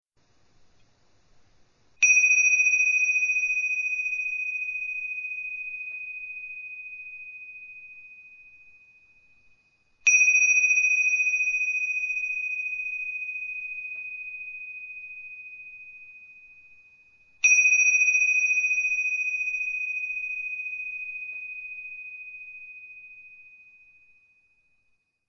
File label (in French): cloches